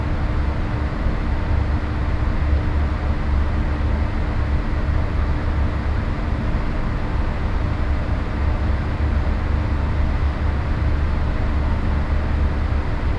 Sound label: Ventilation